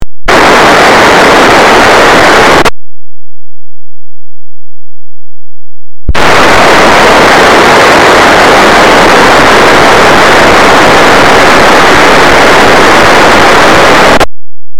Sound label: encrypted p25 as nbfm; op25